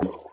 Collision small

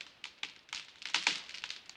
Creak wood heavy Loop

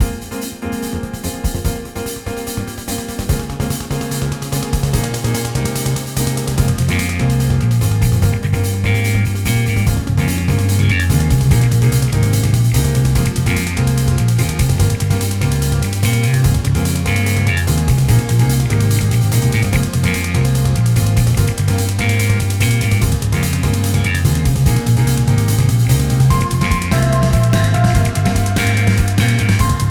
intro inst; Get in the Car, Loser

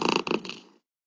Swipe purrs loop end

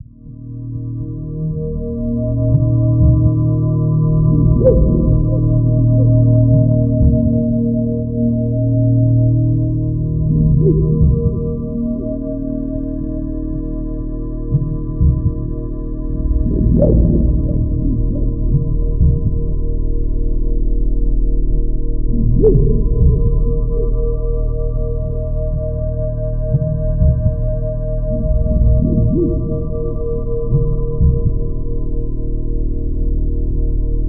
Drone

